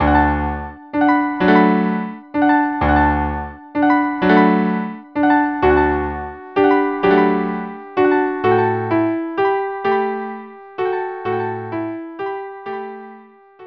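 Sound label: barcarole violin viola cello trio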